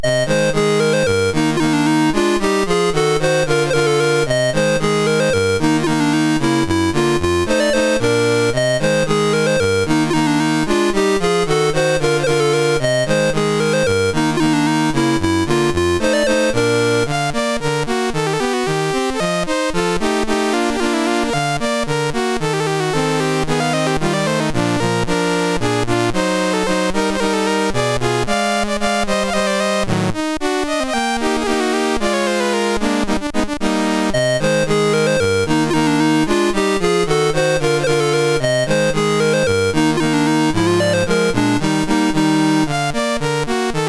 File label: Polka, MOS6581R3